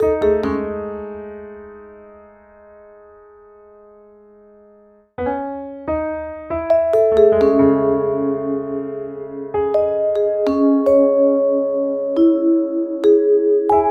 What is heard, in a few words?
Piano Vibes Combo, Cinematic Funk Urban; Sonic Foundry (Sony Creative Software); Cinematic Funk Urban Tone Poems